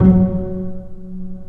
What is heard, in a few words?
Bass Piz G F#2; Digital Sound Factory Virtuoso